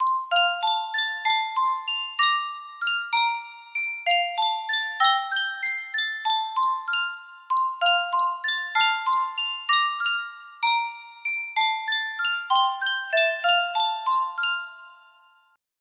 Yunsheng music box

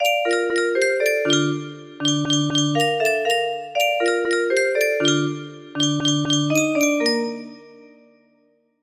melody, music box

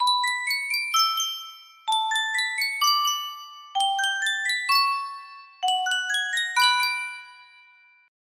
sankyo miniature music box

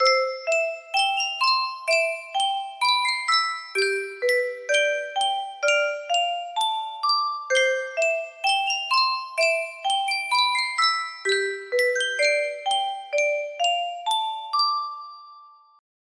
Yunsheng music box, music box melody

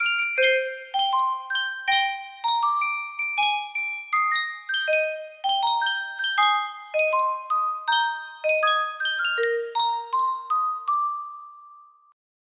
sankyo music box

